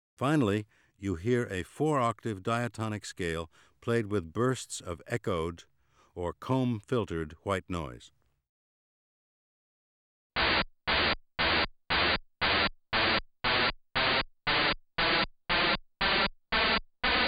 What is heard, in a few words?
Scales with repetition pitch